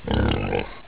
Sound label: PIG; DINK